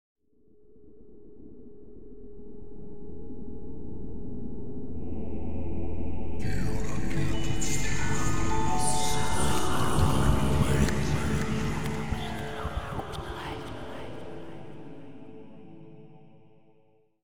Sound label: Demon Jar; yesterday origins gamerip 2016 switch ps4 pc ios macos